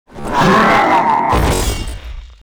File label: WAR DIE, Mob